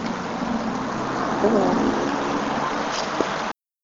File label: cemetery